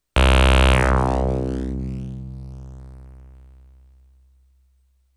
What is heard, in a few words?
OPL2